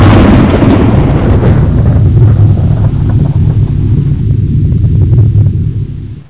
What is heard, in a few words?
cathedral rumble